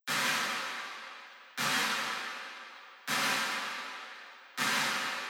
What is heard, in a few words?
Claps Reverb